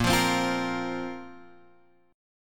Bb Suspended 2nd Bb down Guitar Open F, Bbsus2 chord; strummed